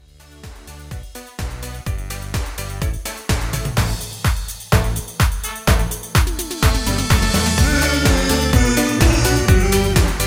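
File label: Instrumental with background vocals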